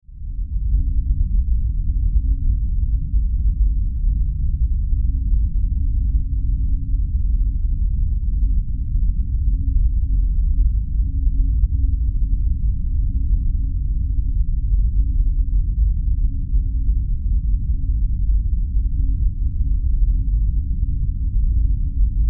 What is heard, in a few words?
Science Fiction Drone Power Hum; Drones (Sound Effects:Sound Design)